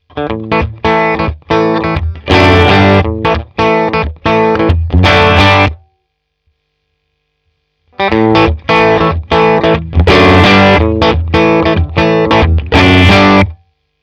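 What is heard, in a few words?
Universal Audio MAX Preamp Off to Full Boosting Amp, guitar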